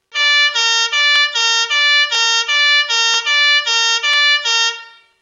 son police, klaxon

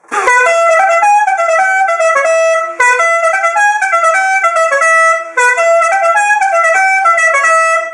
son klaxon; mother at school

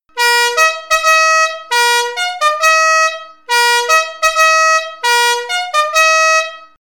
son klaxon la marche nuptiale

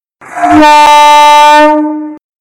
son trompe acier pavillon rond

